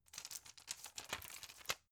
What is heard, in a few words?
plastic blister handle